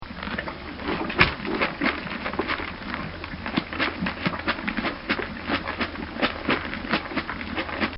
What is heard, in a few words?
A Horse Eating Grain; Horses; Animals; Bobby Cole